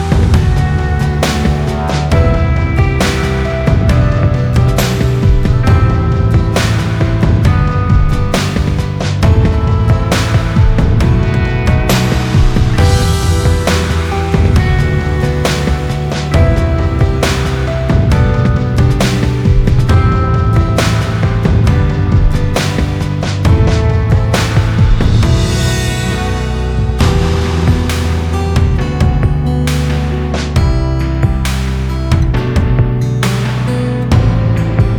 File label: Instrumental